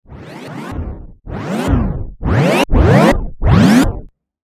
False Start Funky Engine; Machinery Sound Effects

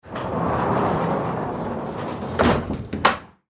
Stable Door Close, Foley Stable Door Close Farm; Barn Doors; Blastwave

